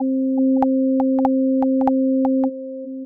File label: slowed hall, slowed and reverb